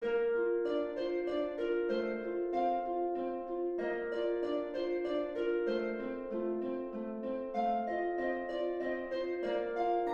danse allemande; sib majeur

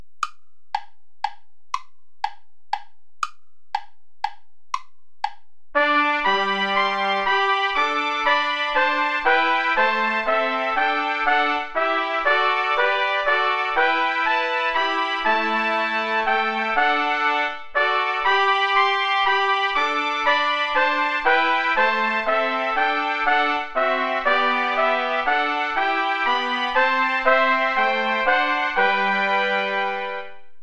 play along without trumpet; scholefield cla ment cotterill the day thou gavest lord ended

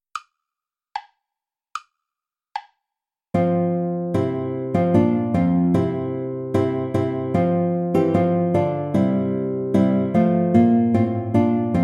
play along without flute

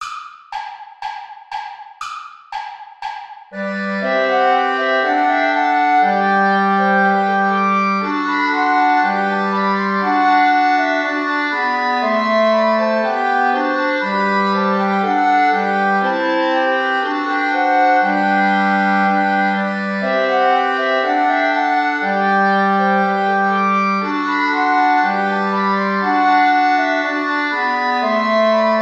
play along without clarinet; praetorius michael bourra

